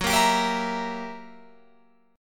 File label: Gb 7th Flat 9th, Guitar, Open F, Gb7b9 chord; strummed; JGuitar